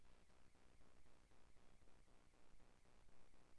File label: breath